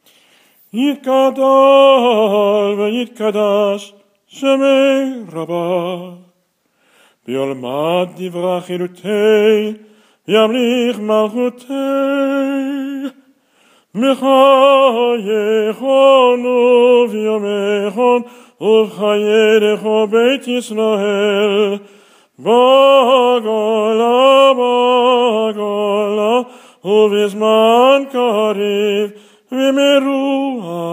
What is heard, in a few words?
hatzi kaddish